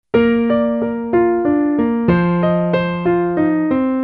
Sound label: piano menuet; менуэт; И. Геслер (1747-1822)